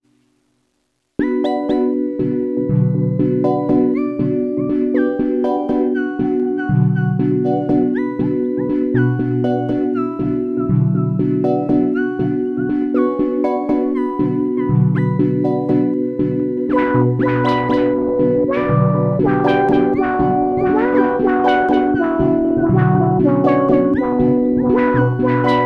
Playing around with the Eurorack tonight. Noise Engineering modules